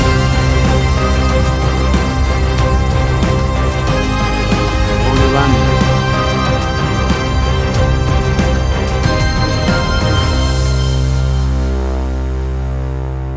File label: Booming Bass Synth Action; AUDIOLANDER